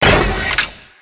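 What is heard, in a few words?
Npc Steam Walker Damage; FlyForInsanity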